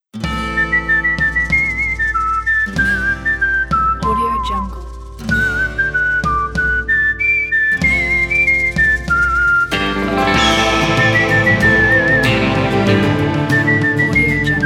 western desperados; serjilio

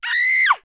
screams shouts human female

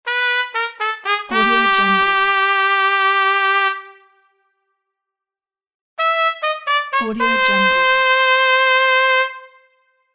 fail trumpet; medusamusiclab

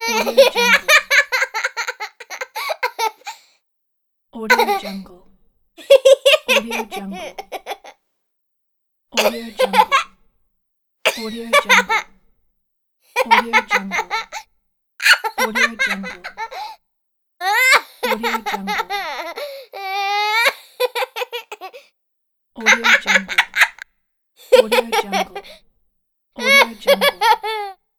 kid laughing

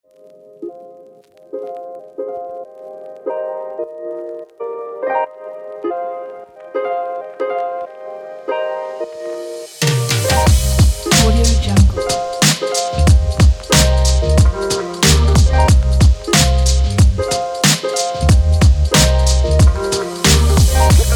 lofi urban hip hop

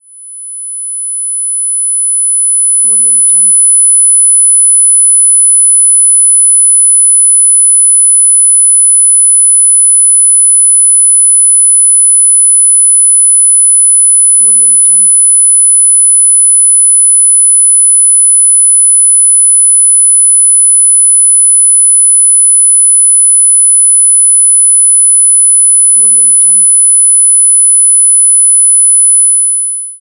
ear ringing

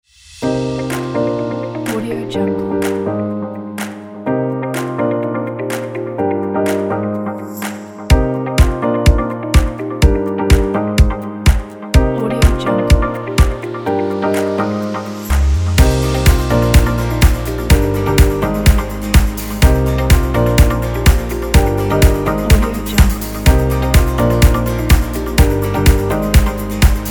inspiring pop folk; audiotekmusic

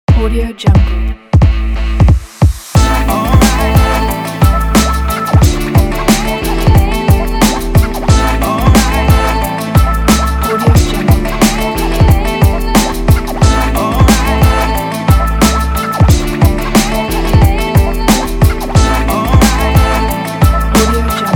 hiphop

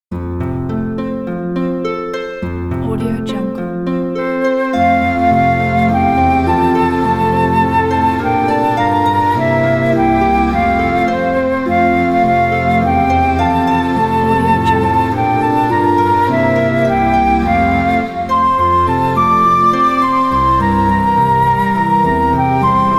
o christmas tree

